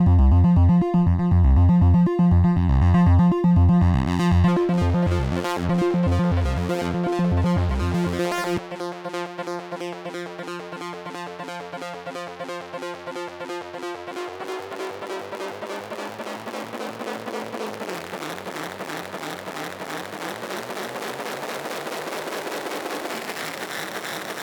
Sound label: Erica Synths Black Hole DSP2 Synth HP Freezer